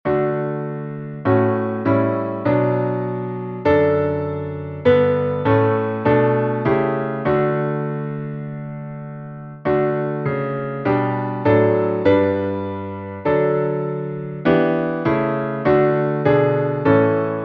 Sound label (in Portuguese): salmo instrumental; salmos 39B; Melodia: Eventide